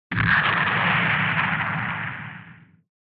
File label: Retro Anime Jet Low Short